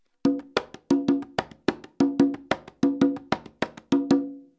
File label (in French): rumba ternaire, congas